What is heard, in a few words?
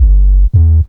Ghost Sub - 9A, Bass; Jungle; Blu Mar Ten; Jungle - 1989 to 1999